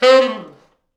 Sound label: T Sax falls, Horns Sax